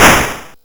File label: Apple II FX Noise Blast; 8-Bit; Chiptune